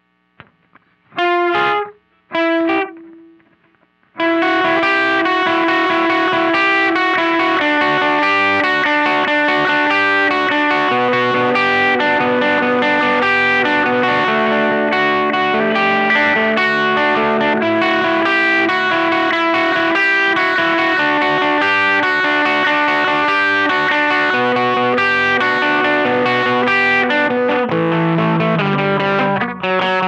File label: Riff Slow